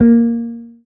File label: marimba guitar; ゆめ2っき